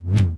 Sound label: pike swing; Star Wars Obi Wan; XBOX